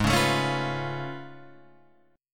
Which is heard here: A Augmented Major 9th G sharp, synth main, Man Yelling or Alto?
A Augmented Major 9th G sharp